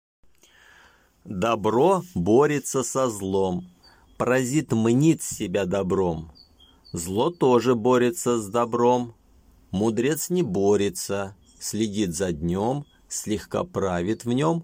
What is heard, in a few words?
Dobro